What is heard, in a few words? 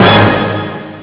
orch blast